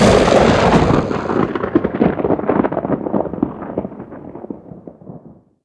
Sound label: electro, weapons